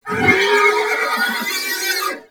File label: Horse Vocal Screech; Warframe; Duviri Horse; Vehicles